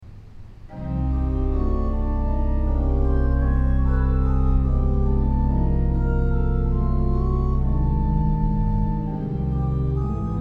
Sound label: Organ